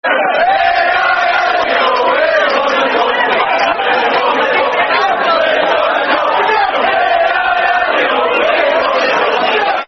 We Won The Cup, Football Chants (Soccer Songs); Liverpool Lfc

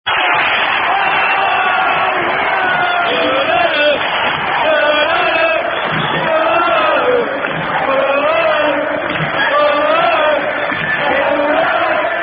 Chant; Unknown Chant

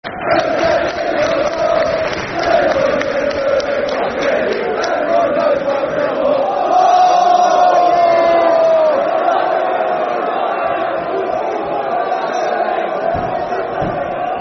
Football Chants; Who's That Team; Crystal Palace Cpfc

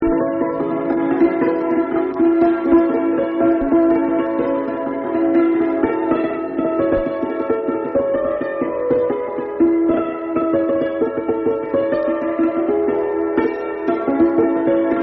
Jig

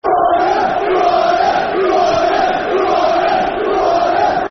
Who Are Ya, Football Chant (Soccer Song); Bristol City Bcfc

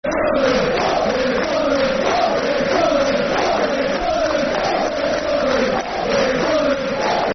Football Chant (Soccer Song), Newcastle United; We Hate Sunderland; Nufc

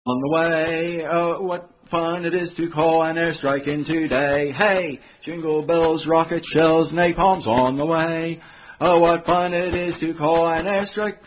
Jingle Bells

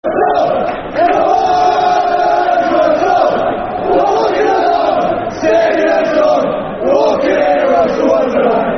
Football Chant; Alex Russell Wonderland; Cheltenham Town Ctfc

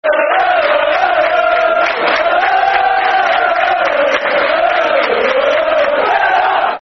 Der, Der Der...villa Football Chant; Aston Villa Avfc

